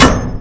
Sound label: hammer hit; weapons